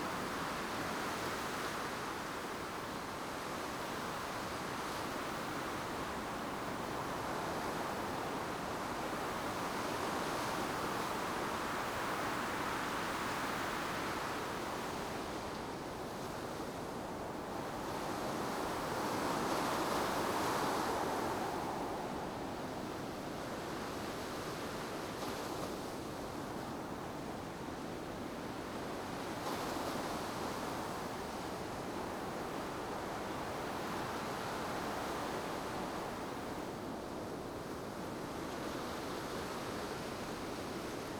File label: ambience beach; Rust